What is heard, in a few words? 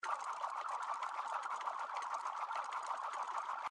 REEL SPIN; slots; Monster Chef